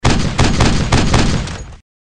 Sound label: Continuous Shot Of The Pistol; Dude Theft War; Android